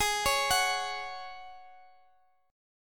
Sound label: G sharp 7th Suspended 4th, Guitar, DADGAD; down; chord shape